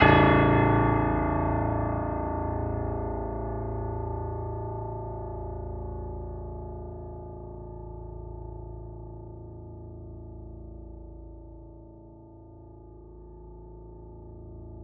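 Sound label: Classical Piano A3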